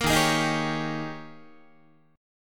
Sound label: D sharp 11th D sharp, Guitar; D#11 strummed